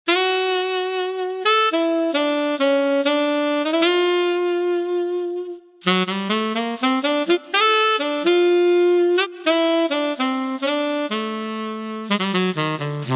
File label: tenor sax exercise, Ketron AUDYA; bati ses ve ritim tanitimi